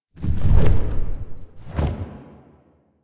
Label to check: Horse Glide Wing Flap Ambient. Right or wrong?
Right